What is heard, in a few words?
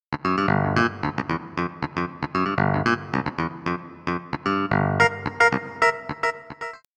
harpsichord